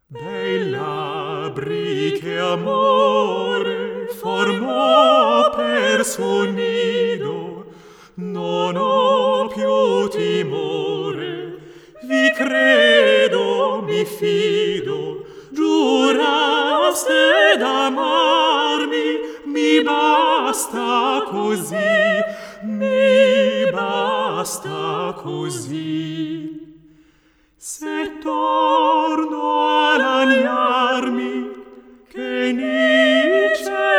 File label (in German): Mehrstimmige italienische Gesange; ''Bei labbri che amore''; Der Glorreiche Augenblick Op.136; WoO99